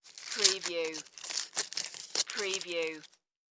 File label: Candy Wrapper Small